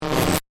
Static Voltage, Production, Element, Static, Voltage, Buzz; BlastWave